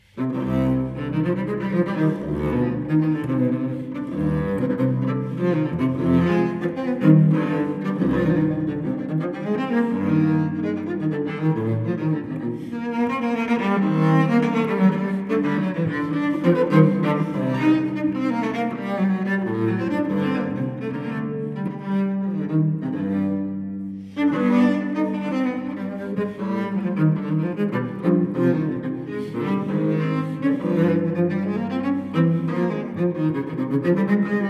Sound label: Cello Suite No. 5 in C minor Courante; BWV1011; Johann Sebastian Bach